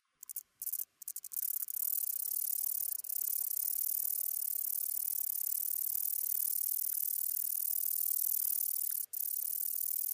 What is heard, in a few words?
Polysarcus denticauda; BIOAC ORTH